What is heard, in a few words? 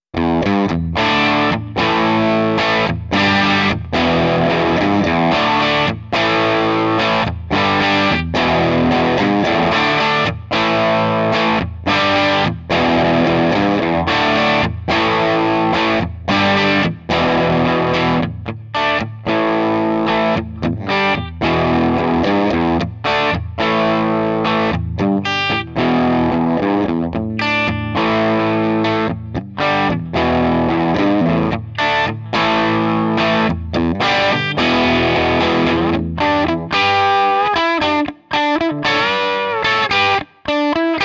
Gibson SG Special HB Trebel an DigiTech RP70 Modell Marshall JCM800; Gitarrenvergleich